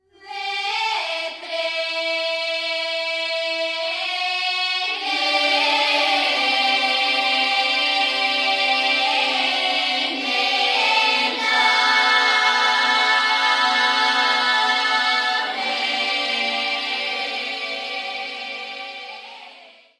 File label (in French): Bulgarie; Vetre le Nenaveiniko